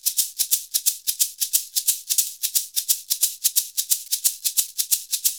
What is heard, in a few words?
SHAKER, Modern Percussion Loops